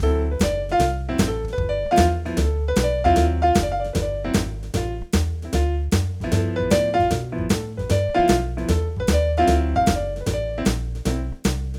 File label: Italian Piano PA4X